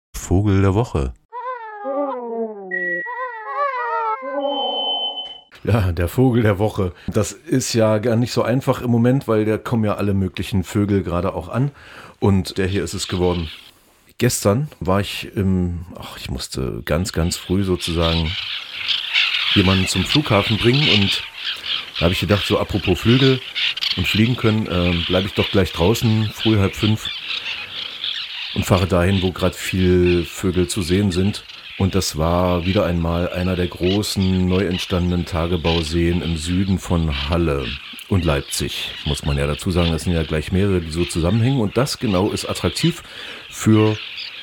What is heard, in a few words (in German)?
Weissbartseeschwalbe; vogel der woche; Frühjahrs-Vogelzug